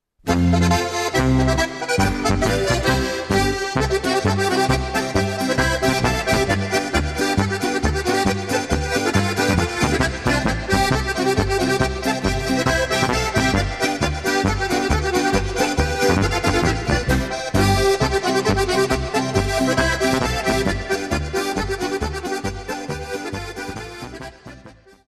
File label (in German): marsch